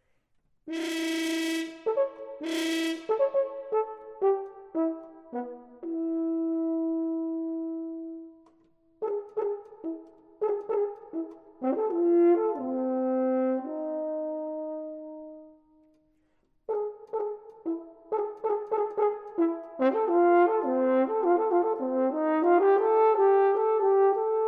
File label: Sonata For Horn Solo, Allegretto